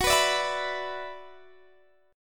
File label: F sharp 7th Suspended 4th Sharp 5th, Guitar, DADGAD